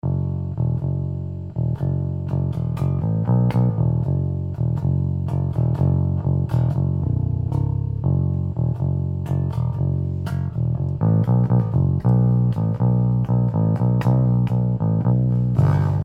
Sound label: bass loop